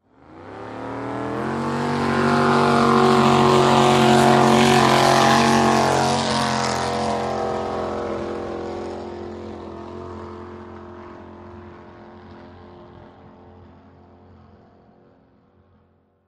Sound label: Drag Boat Start by